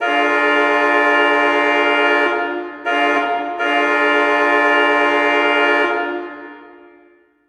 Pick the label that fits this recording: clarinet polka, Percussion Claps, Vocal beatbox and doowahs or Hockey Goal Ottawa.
Hockey Goal Ottawa